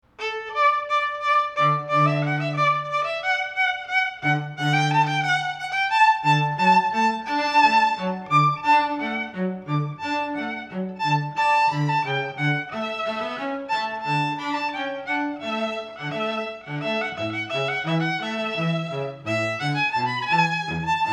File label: Strings Duos Fireworks; La Rejouissance (Violin & Cello Duo)